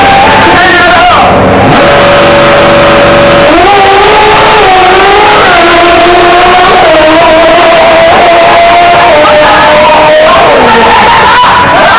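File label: bike, ドラッグレース バイク; 爆音！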